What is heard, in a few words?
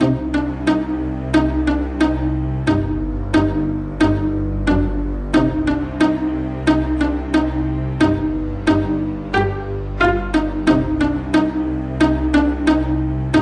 plucked string melody hiphop; STRINGS ORCHESTRAL CLASSICAL LOOPS